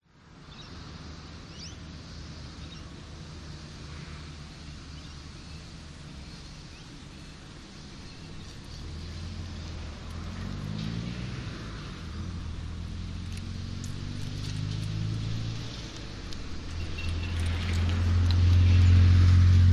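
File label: town outskirts road occasional traffic birds groundsmen cutting grass; public places; cooroy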